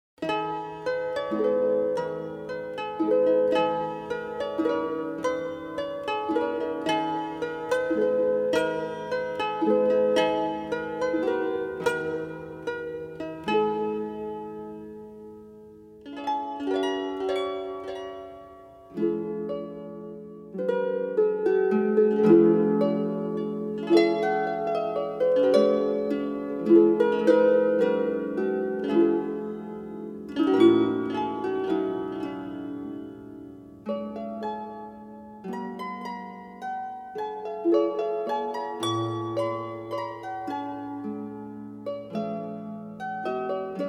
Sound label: harp and dulcimer clean; musicmakers volume 1; versilian studios